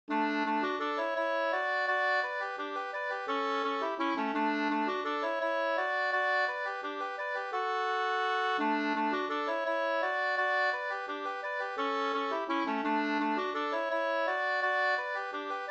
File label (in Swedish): vals; Johan Petter Landholm